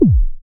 BD ANALOG; Sound & Vision - Gigapack II CD 2

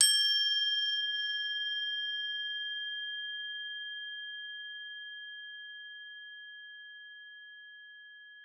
CRO G#4, CROTALES; Xsample VOL-4

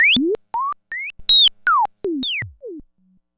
TECH BIRD; E-MU Formula 4000 Series Vol. 2

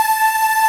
Ending loop

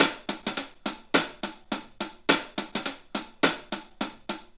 Hi Hat Pattern

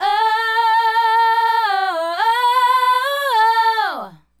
Female Group, Hip-Hop and RnB Vocals

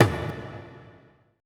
ETH DRUM

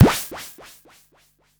zazapaz, machine perc; Zero-G Ambient 1

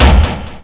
hit, percussion hit

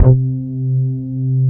Korg POLY800 C1; Back In Time Records; Korg Universe VOL-1

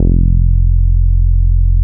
NORD MF F2, NORDY BS

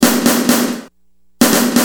Tribal, Drums Perc; Industrial

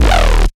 Superlectro, bass

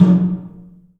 DRUM; The Piano; InVision Interactive

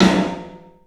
PA DEEP R, CLUB SN VRB; 3D Sound; 3D Drums

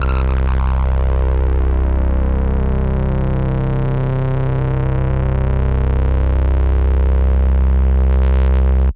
Synth Prophet Bass C; Electronisounds

